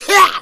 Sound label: smoker attack, voice; Left 4 Dead 2